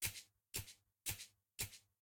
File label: bounce; Katawa Shoujo; katawa shoujo re-engineered; emi